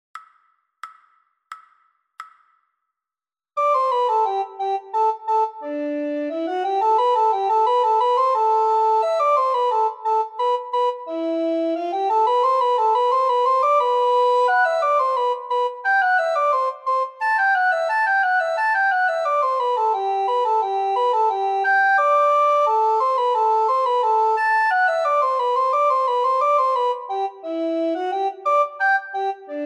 descant, recorder

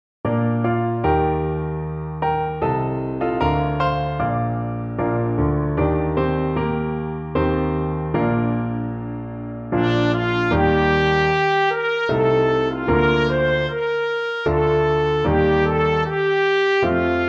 trumpet

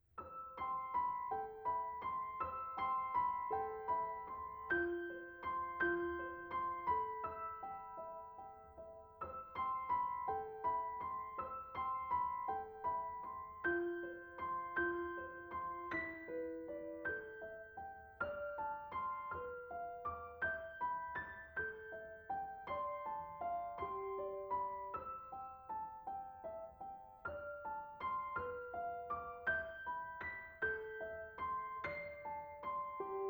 twinkling fairy; piano

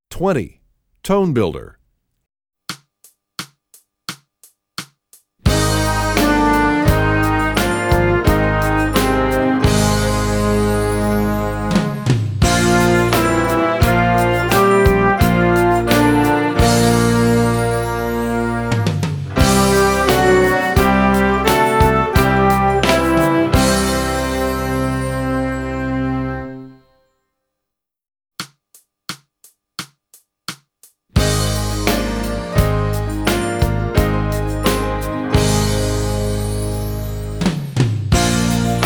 Tone Builder, trombone; essential elements

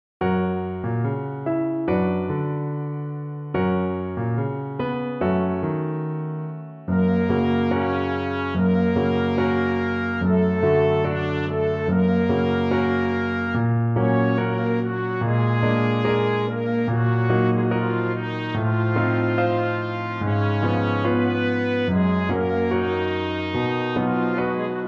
trumpet

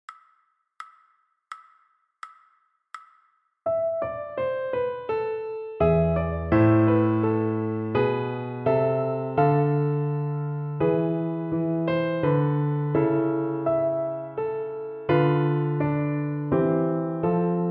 Prelude Corelli piano

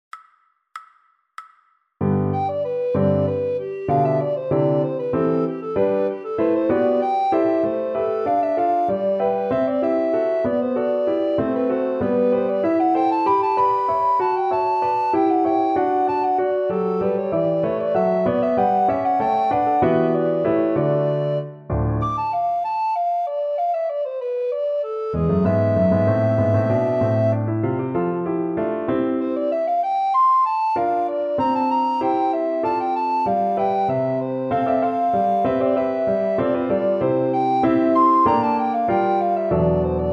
Recorder; Murril

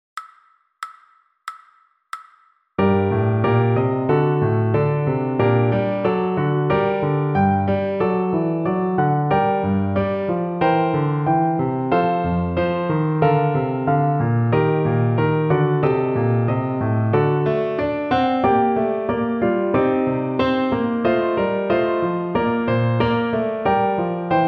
piano slow; Handel

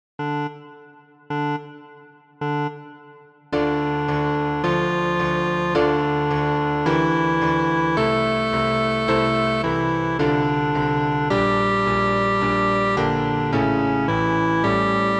Grave trom, trombone

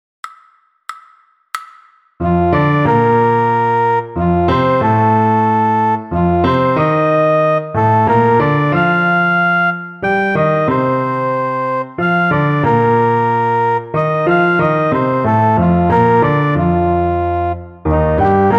slow, trombone

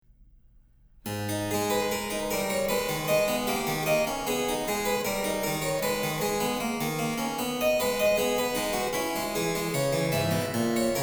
Invention Harpsichord; Bach

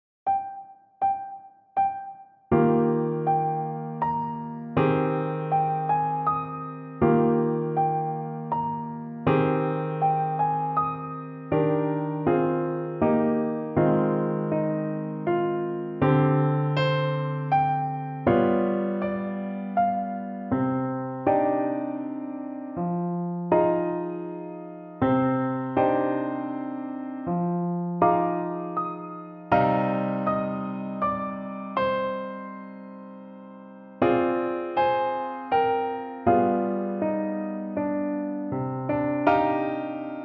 piano

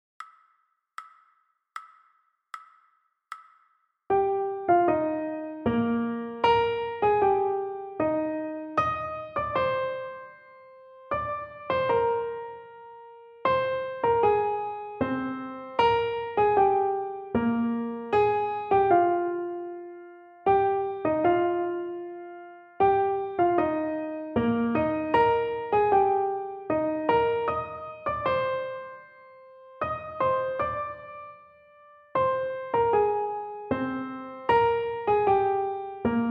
Etude trumpet; Etude 7 Balasanian

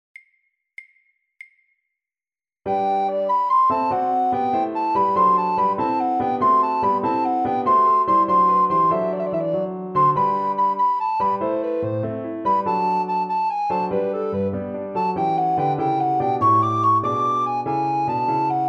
Recorder; Sammartini

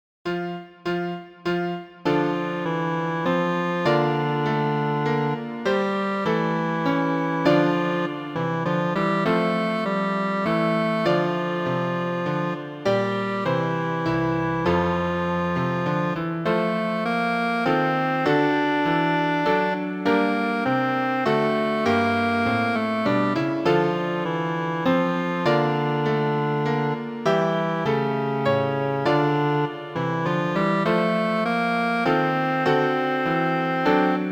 trom; Bach

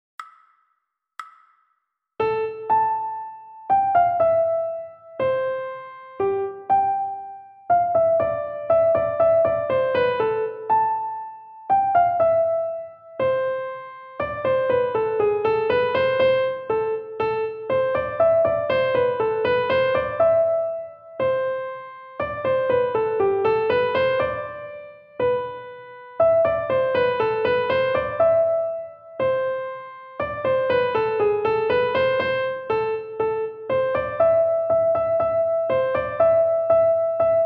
slow, recorder

